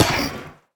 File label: hurt, copper golem, oxidized; Minecraft